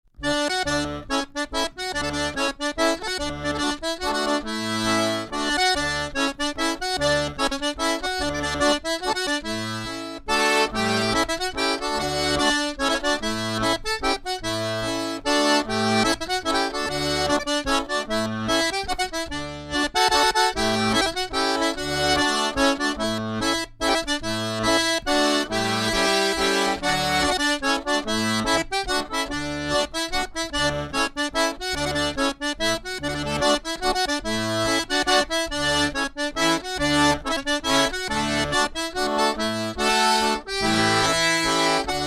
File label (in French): Mazurka